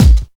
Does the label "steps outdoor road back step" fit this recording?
No